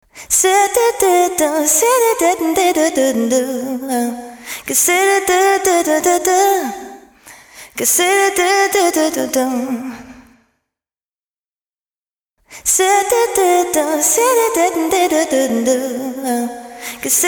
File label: vox fem wet variations